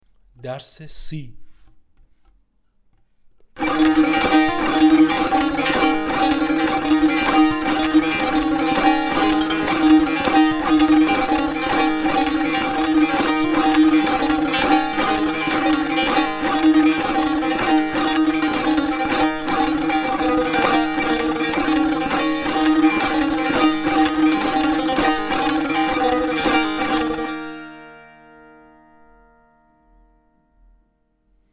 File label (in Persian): مشق تنبور; تنبور جلد دوم